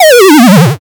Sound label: power down